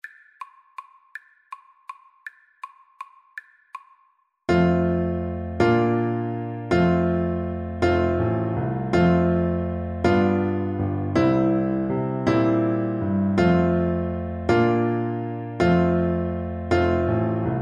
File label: Oboe